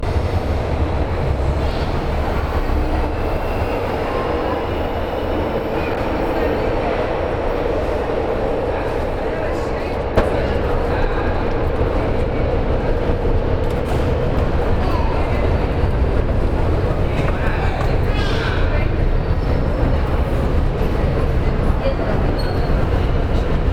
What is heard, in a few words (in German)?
morgens St.Cecilia unterm Minhocao, Fieldrecordings Brasilien